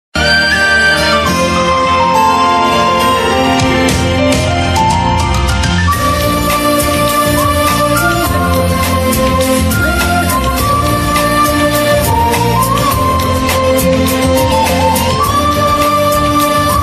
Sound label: Happy BG Tune; Silsila Pyaar Ka; Raunak Kajal